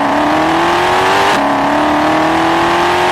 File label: second, vehicles